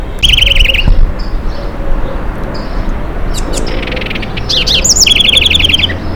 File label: Troglodytes aedon song